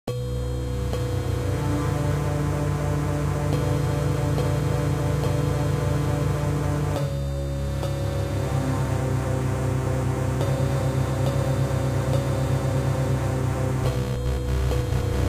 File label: Unused Music; MLSS